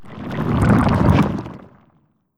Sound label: Flam Eater Lava